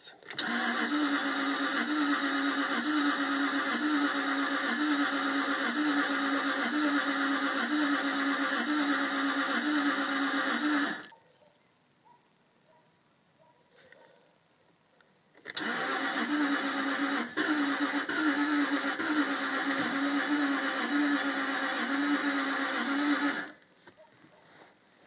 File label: stype 2003 4 2l not start; s type r supercharged v8 x200